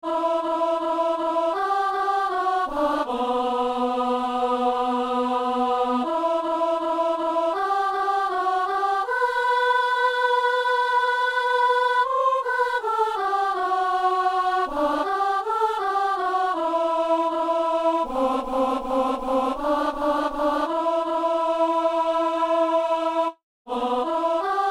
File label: soprano